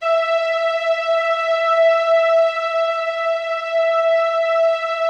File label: E4, STRINGS, Yamaha VP-1; Music Store; JODYSTRINGS